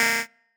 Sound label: marimba bike; ゆめ2っき